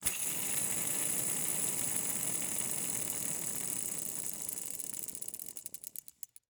Rollerskates, old fashioned, all metal, wooden wheels, swipe, spinning, slow to stop; 2 mics; focus wide; Eiravaein Works; Vaeyan IV